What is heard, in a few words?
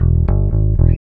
bass loop; AMG; Now CD-ROM (Roland)